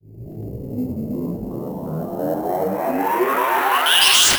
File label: Sweeps Up, Effects; Vengeance; Essential Clubsounds Vol.4